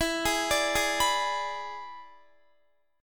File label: E 7th E down Guitar; arpeggiated